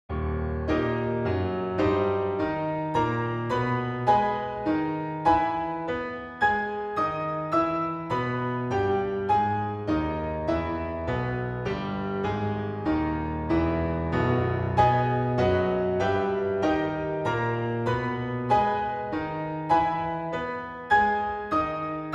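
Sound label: octaves, organist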